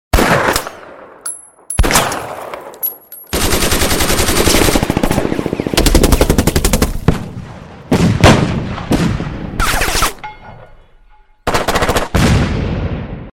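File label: shooting ringtone; strelba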